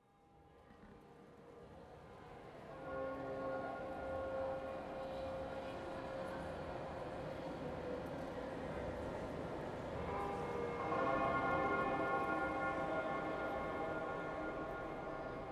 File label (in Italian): Campane